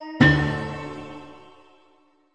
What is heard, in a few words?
Defeat; Elsa